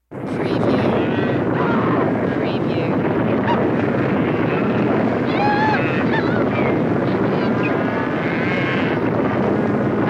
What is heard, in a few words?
Cattle Stampede Cowboys